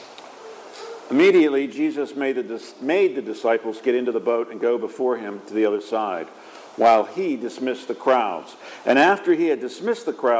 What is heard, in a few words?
Gospel